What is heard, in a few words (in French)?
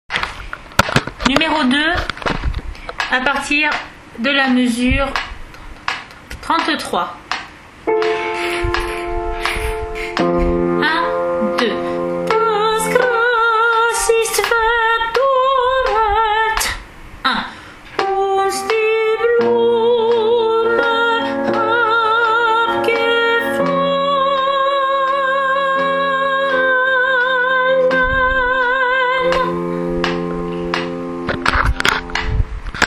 Brahms requiem allemand soprano; 2.Denn alles Fleisch es ist wie Gras